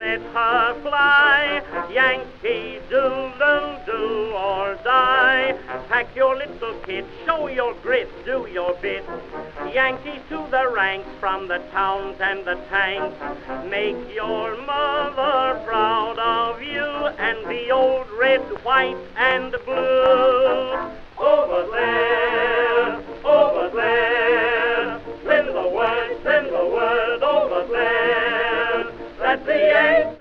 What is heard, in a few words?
baritone and chorus with orchestra